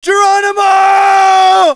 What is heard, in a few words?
fall; quake2